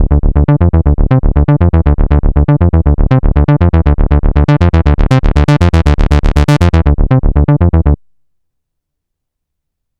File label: dry bass; novation peak test